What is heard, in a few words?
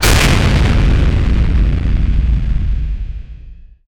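lightning bolt spell crack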